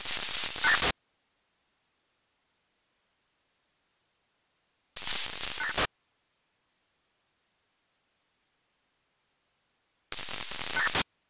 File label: Unk signal, Signal Identification